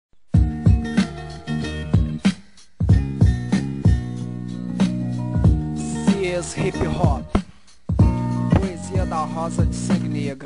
hip hop

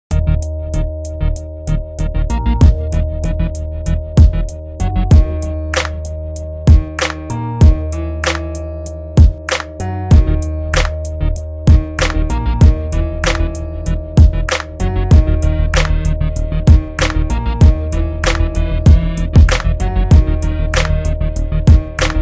instrumental

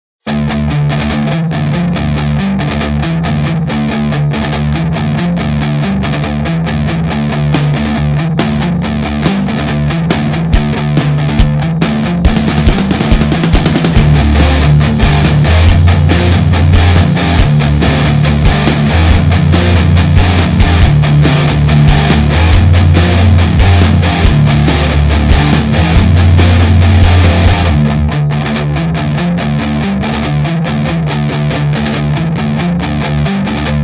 instrumental

